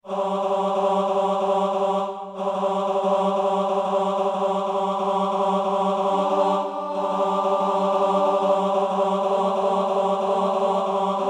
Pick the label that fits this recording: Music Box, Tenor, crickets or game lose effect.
Tenor